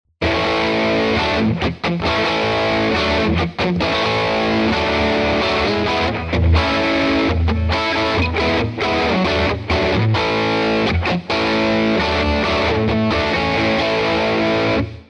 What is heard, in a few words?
Hammer, Guitar Rig; Bamon preset